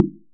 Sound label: impact Wood medium; Kenney's Sound Pack; Impact Sounds